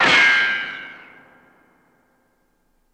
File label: Bullet Ricco Single